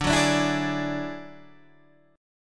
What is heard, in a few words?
F sharp 7th Suspended 2nd Sharp 5th, D